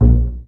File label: BA PIZZ, Orchestra; Best Service; Peter Siedlaczek's Advanced Orchestra